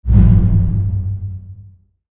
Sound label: Pillar Shockwave